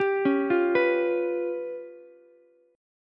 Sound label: C sharp 7th Flat 5th C sharp down Ukulele; C#7b5; arpeggiated; JGuitar